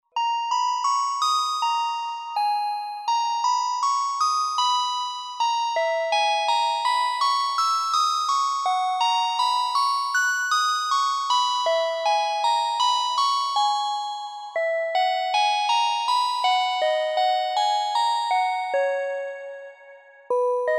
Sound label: Synth Bells